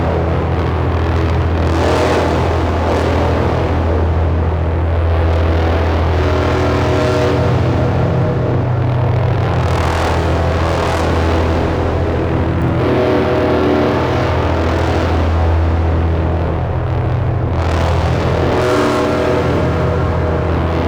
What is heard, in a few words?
Ship Interior Amb; Fomorian Ship; Space Battles